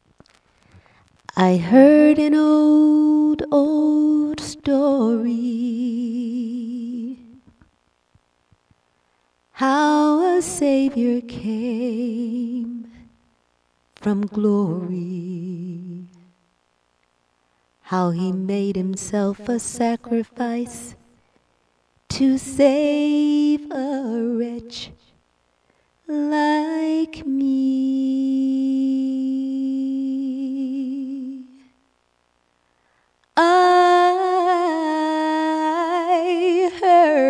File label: Sings